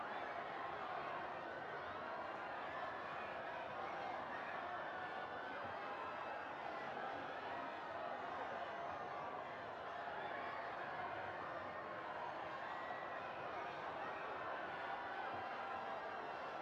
Crowd Screaming Distant; dawn of the monsters

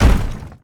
Big Fly Wall Hit; gamerip

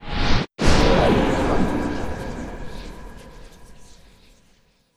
Fireball

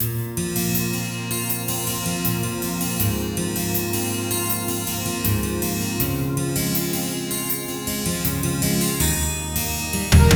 Ending; K1 World Grand Prix 2001 Kaimakuban by Xing; PS1

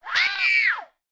harpie death; enemies; unsighted

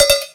Sfx Wintergames

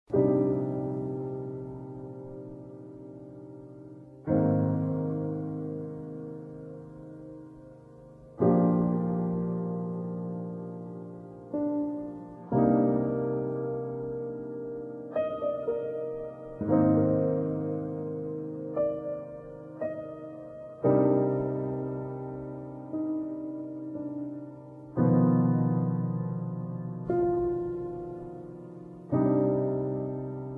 Piano Sad Emotional; menherafflesia flowering abyss; android

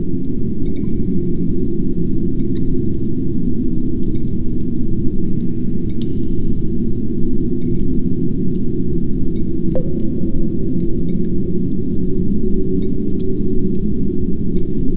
Amb Boss cave

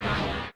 Dark Monster voice prepare; enemies

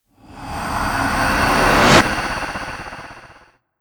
Dark Magus Impair Wind

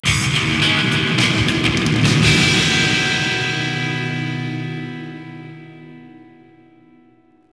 Str G#

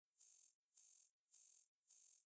Env Grasshopper Chirp Rev Loop

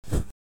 Pass by; The Henry Stickmin Collection

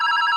Phone Ring

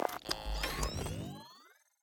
Teleport Power-On

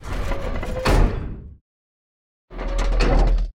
Weather-Disruptor Fall

